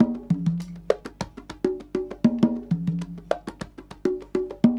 Percussion, R&B Drums

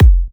F, kicks; markus hakala kicks

